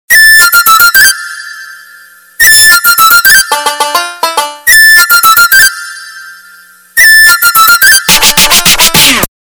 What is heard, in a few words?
Fluet tone